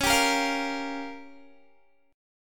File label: Db 6th Flat 5th Db down Guitar; Db6b5; strummed